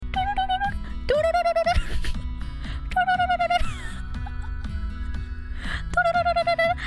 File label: turururururu